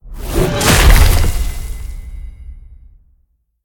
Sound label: impact; Smite; Tiamat